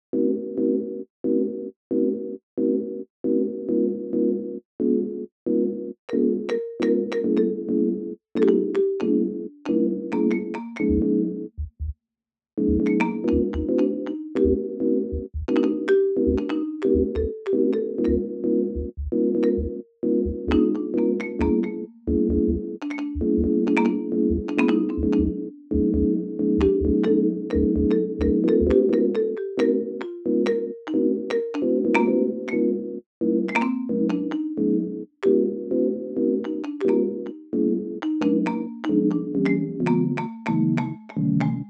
Sine Wave

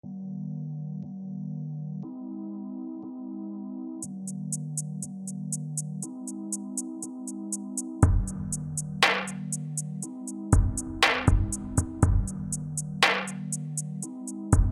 Sinwave

